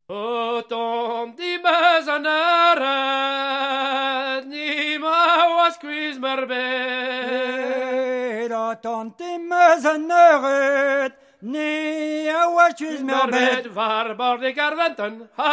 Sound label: Plinn Bal